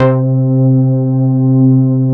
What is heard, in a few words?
DAN, synthesizer bass; VFX-SD